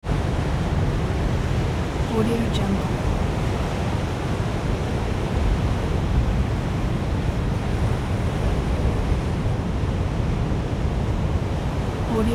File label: hurricane storm